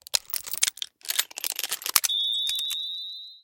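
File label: unknown whistle after reloading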